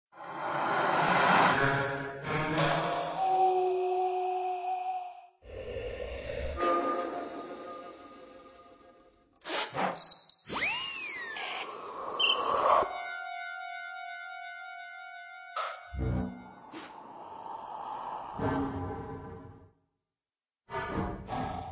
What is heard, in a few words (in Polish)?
Instrumenty